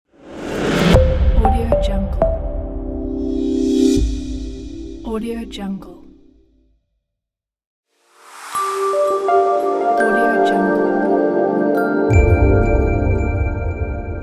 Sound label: simple reveal logo